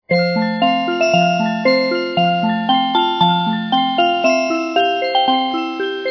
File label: Music Box